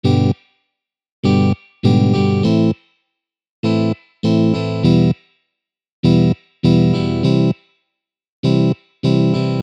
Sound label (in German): Schlagmuster; pfadfinden